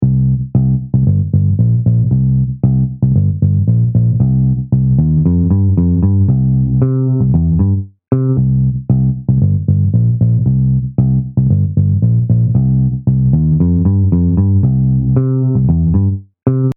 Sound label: Bass Big Round